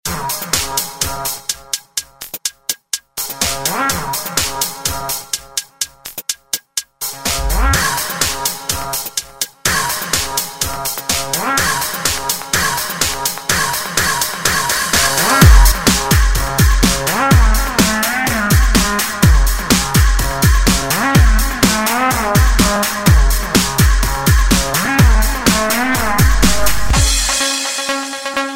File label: trance remix